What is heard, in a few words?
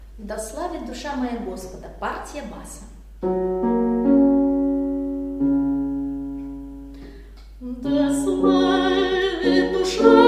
bas; epiphany; da slavit dusha moya